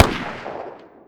ar far

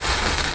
flare

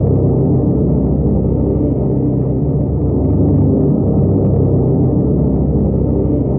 drone, roof top

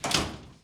broken elevator closed l; lift

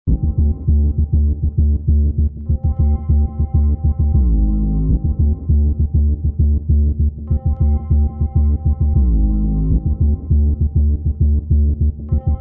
theatre music

